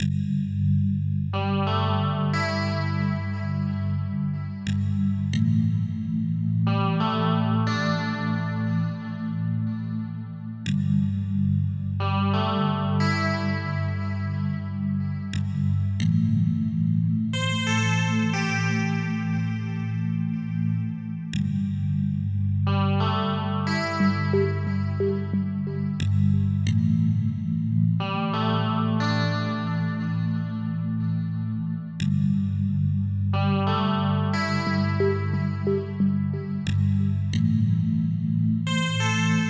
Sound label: ambient; predator